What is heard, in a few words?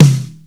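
snare drum; Greytsounds; F2 SNARES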